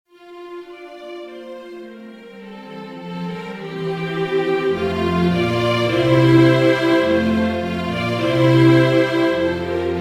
string orchestra

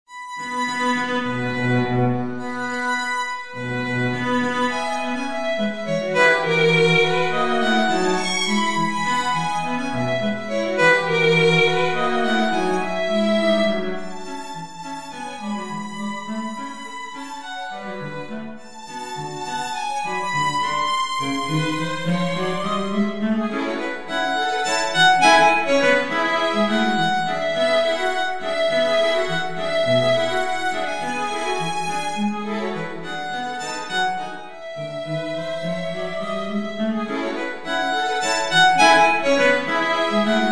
string trio

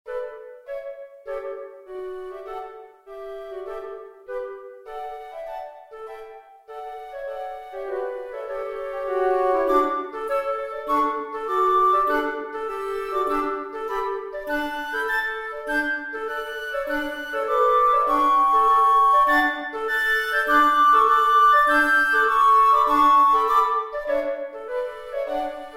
flute trio

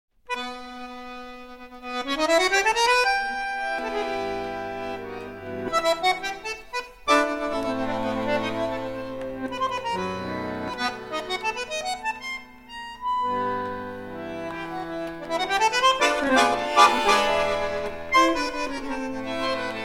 Bandoneon

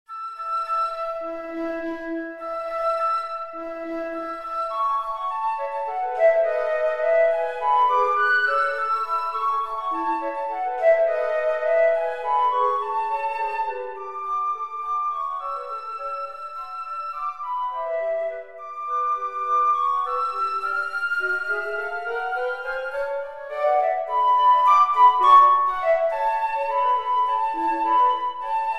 flute trio

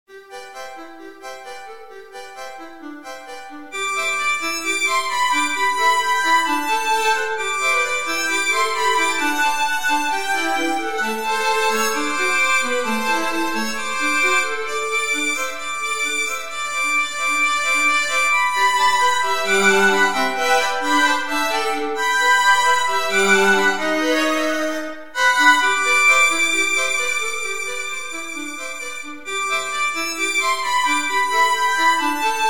violin trio

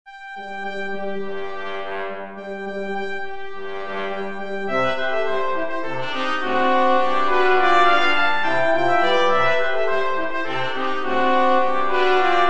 brass quartet

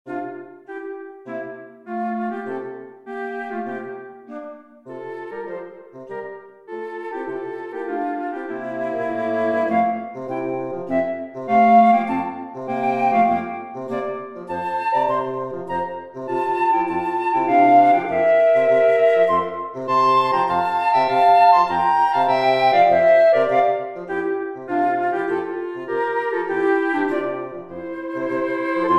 woodwind trio

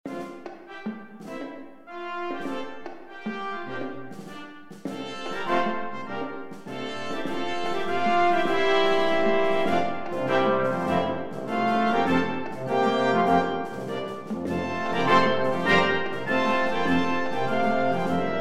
brass band

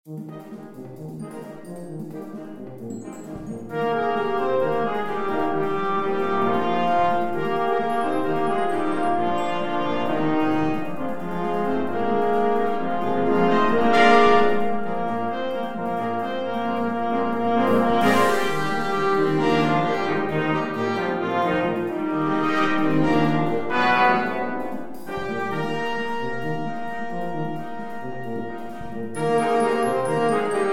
brass band

